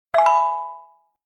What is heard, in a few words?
notification